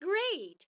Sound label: camera shutter great; Samsung SGH-i617 BlackJack II